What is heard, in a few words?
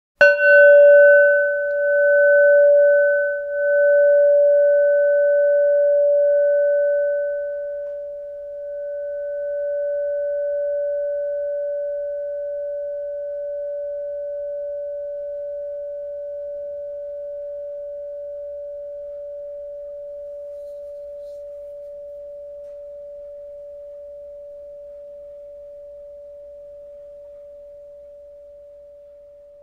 Spin gong Big